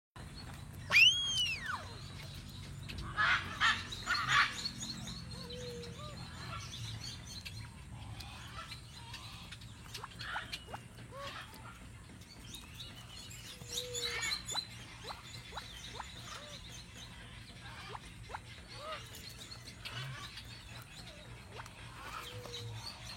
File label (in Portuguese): canto do mutum de bico azul